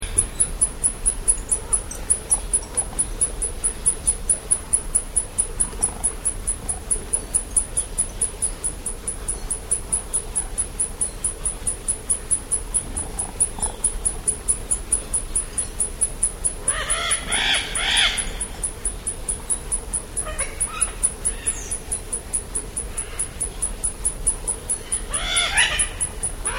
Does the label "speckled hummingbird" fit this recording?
Yes